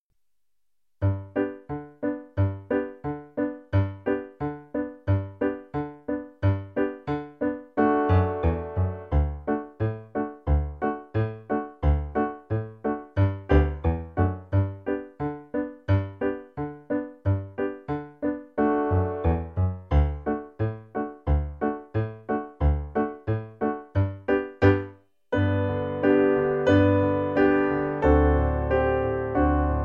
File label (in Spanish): Polca de la estrella PIANO